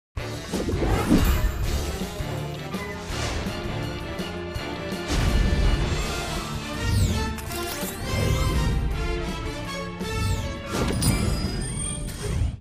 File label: Hoverboard; Disney Infinity